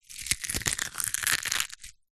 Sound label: egg shell squeeze crack hand; food